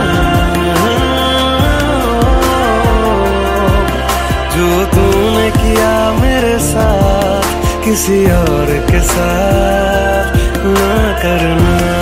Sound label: hindi ringtone, bollywood ringtones